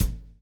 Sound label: 123 groove; AMG Gota Yashiki Groove Activator